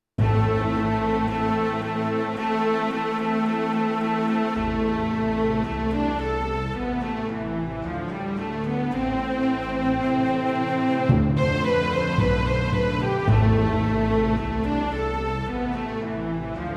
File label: small orchestra